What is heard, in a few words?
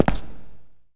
tile; svencoop; AoMDC